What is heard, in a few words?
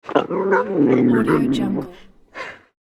wildcat affectionate grunts; hollywoodedge